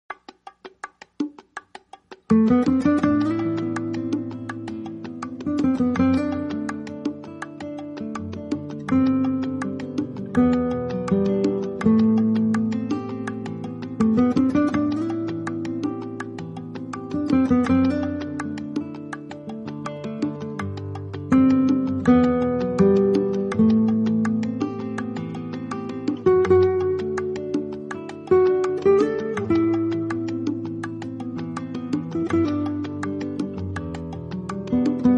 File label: flamenco; salamanca